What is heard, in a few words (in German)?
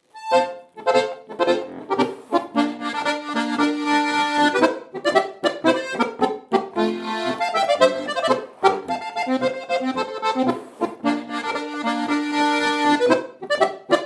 polka, Volksmusik, Akkordeon; Ziachorgl